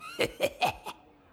heheheheheh

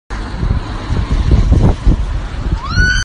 dog screaming

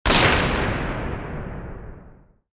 gun shot; single gunshot

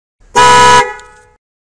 car horn beep beep

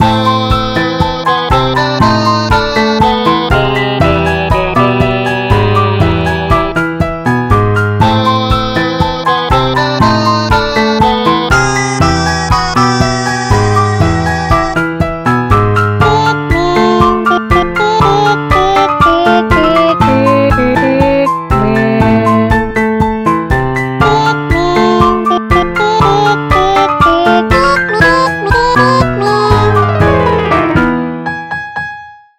Game Over; Friday Night Funkin; VS MXpikmin

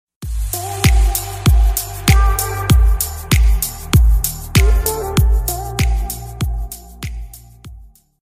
instrumental remix

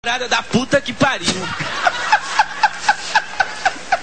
hihihi

